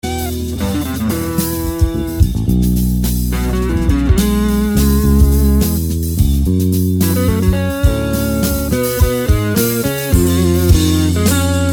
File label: Guitar Melody